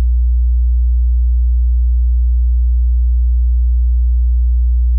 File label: 60hz